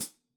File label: hihat foot, gretsch kit